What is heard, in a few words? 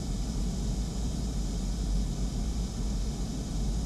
Ambience Loop, outdoor